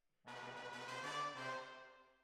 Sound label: Hall